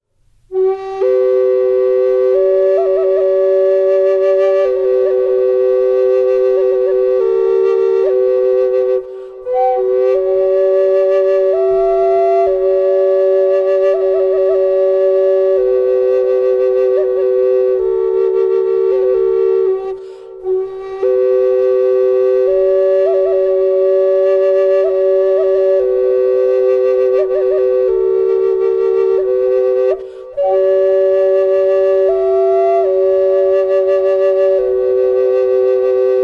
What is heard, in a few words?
drone, indian flute